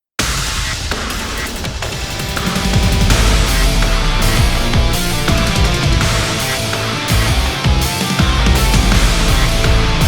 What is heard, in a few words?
Instrumental